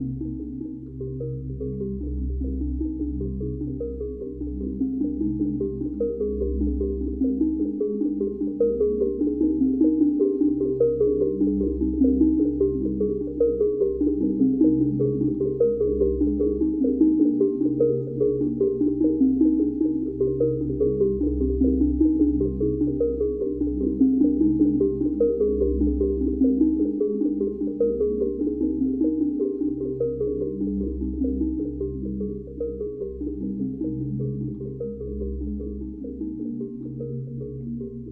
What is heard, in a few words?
Drones And Turtle Drum; Valley